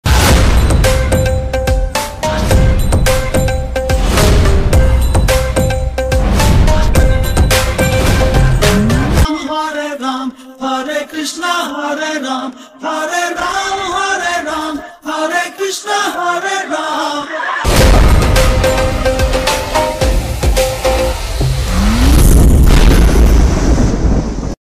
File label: bgm ringtone teaser bgm ringtone horror movie bgm ringtone; bhool bhulaiyaa 3